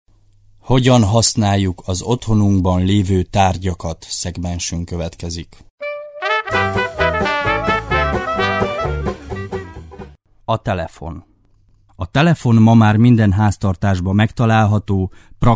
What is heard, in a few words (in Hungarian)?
telefon